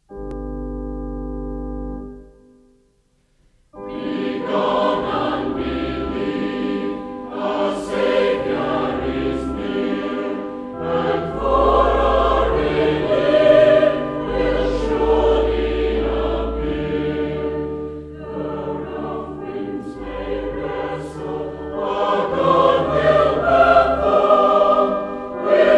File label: Choir, hymns